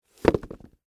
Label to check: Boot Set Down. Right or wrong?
Right